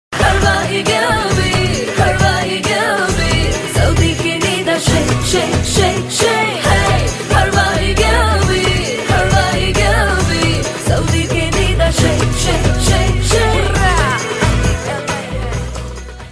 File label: arabic tamil